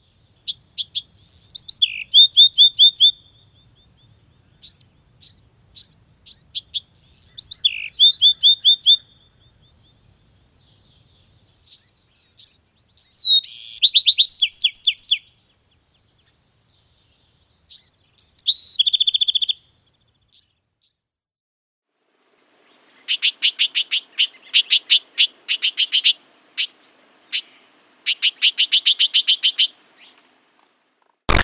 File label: Bewick's Wren (Western)